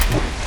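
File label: armor, movement; smite; heimdallr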